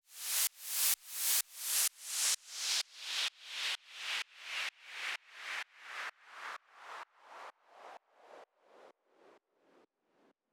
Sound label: 128BPM FX; Speedy; Vengeance Samples; Speedy Kit